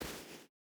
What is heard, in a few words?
footstep barefoot sand jump land; Rust